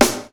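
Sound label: SNARE, Hip Hop; InVision Interactive; 40 Oz Phat Slammin Hip Hop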